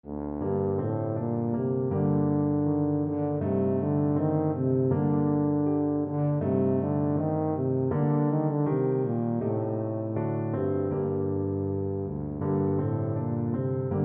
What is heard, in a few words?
tchaik old french song, tuba